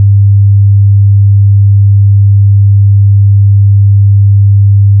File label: round sin 100 1